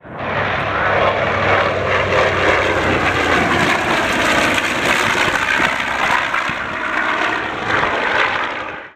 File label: flyby; Zepplin